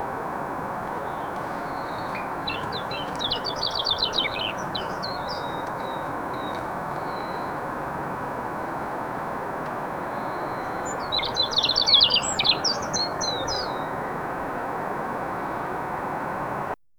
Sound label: nature ambience garden